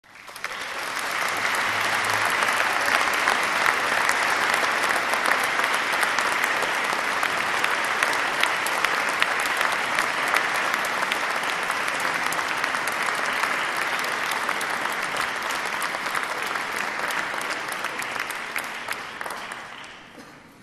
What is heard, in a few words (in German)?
Applaus